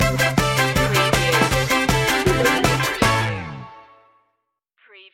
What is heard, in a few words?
happy ska town stinger